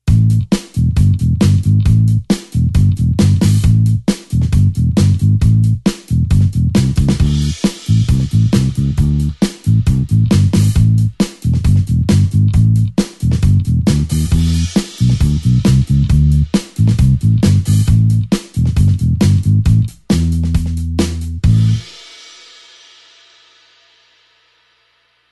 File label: blues bassline 8beat A